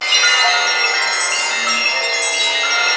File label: A#4 dream, belltasy; Northstar; The Wizard VOL-1